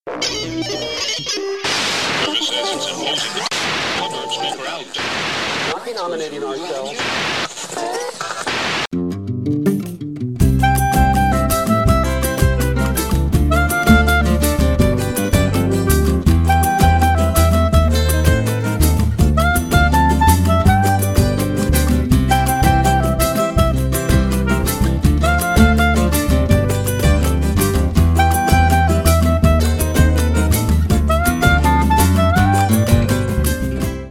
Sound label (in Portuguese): TRECHO MAXIXE; PGTIAE 2011 - Ritmos Musicais